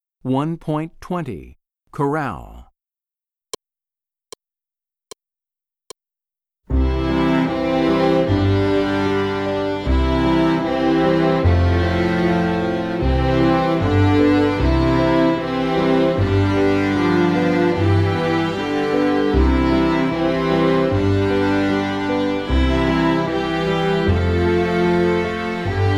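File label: Chorale, String Orchestra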